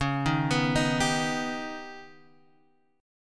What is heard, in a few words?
D sharp Augmented 7th C sharp, guitar